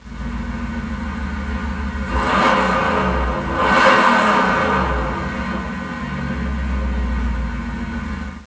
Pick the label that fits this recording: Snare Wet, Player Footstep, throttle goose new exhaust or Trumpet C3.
throttle goose new exhaust